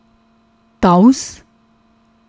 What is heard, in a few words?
taus